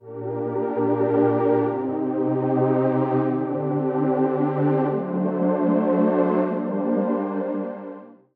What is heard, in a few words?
catch; Meteor World Actor